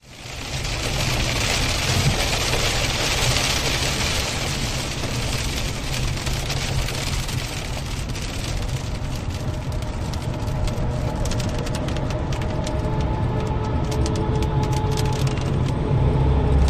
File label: rain while driving